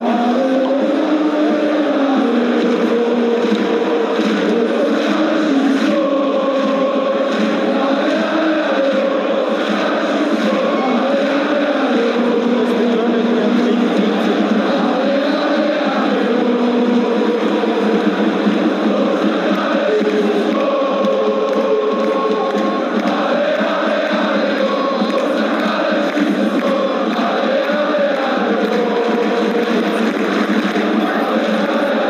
allez allez allez oh, fan chants

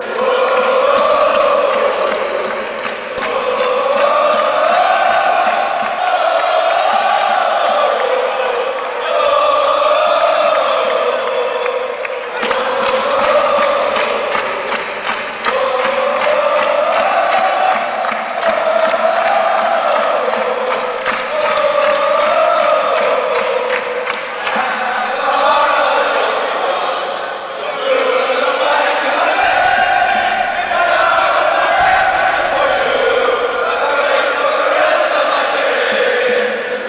heart ultra fan chants

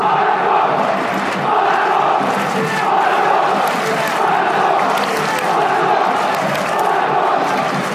chants; monaco monaco monaco

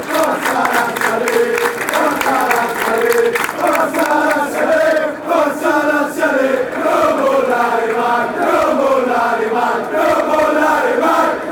forza lazio ale claps, fanchants

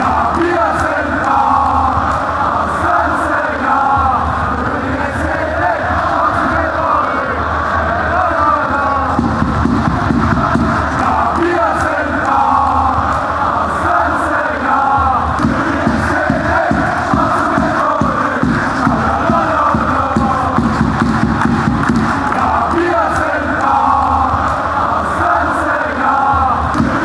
chant, fan chants; dresden

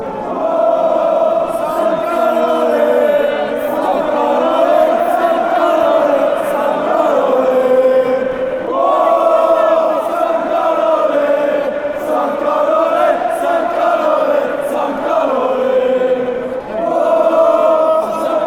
woah allez, fan chants; st gallen

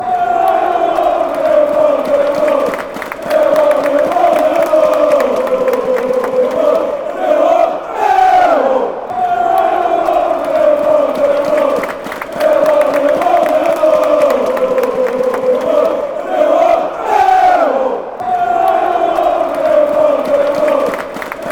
fast, fan chants; millwall millwall

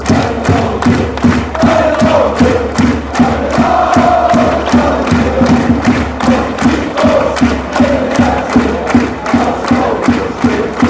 a rhomb, fan chants

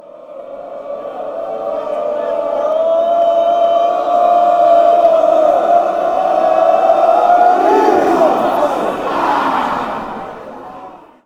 woah you sit bstard, fan chants

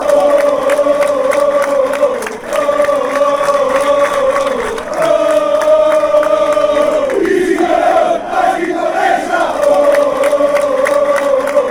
fan chants; magic brescia

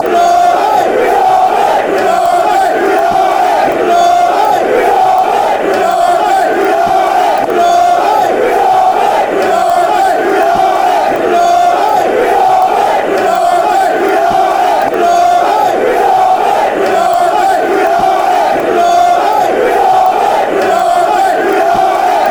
green army green army green army fanchants

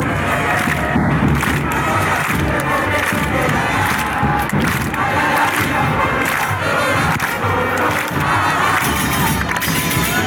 pasos al costado fanchants

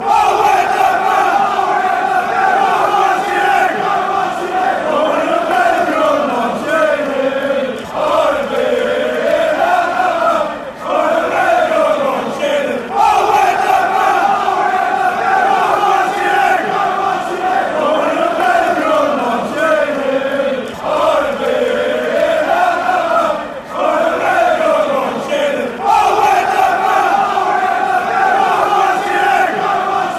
oh when go marching, fanchants; villa

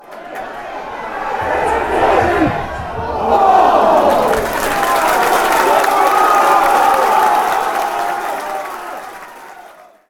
nearly scored, chants

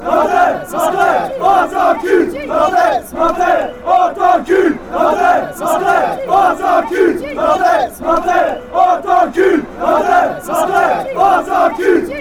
fan chants; from nantes

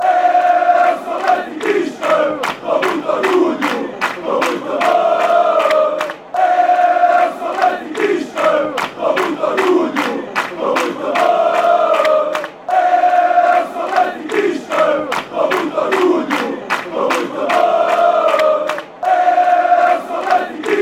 benfica with pride, fan chants

